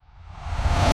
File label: propeller aircraft whoosh; acceleration